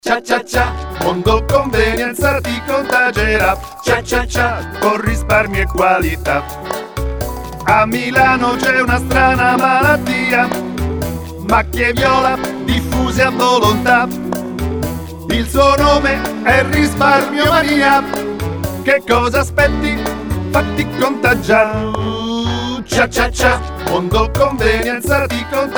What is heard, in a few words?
Jingle